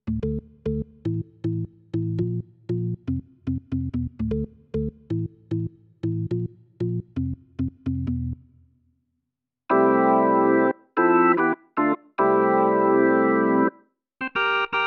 Casio CT-S1000V Organs

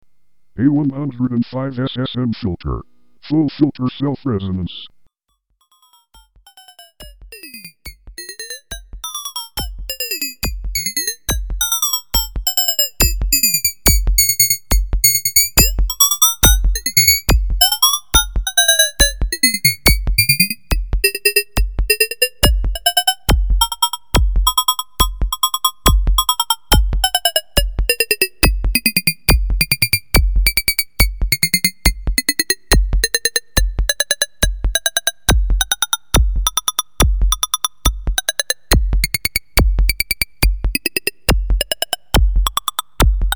SSM Filter Self Reso

